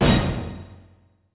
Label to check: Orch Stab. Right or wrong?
Right